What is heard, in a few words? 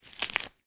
leaves rip tear; foley